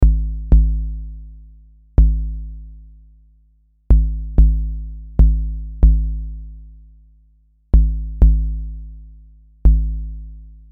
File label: sine kick